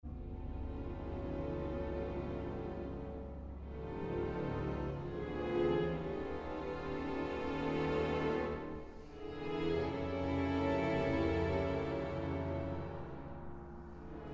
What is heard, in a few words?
PERCUSSION CONCERTO